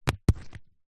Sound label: foley rock small roll land compacted damp mud ground; zapsplat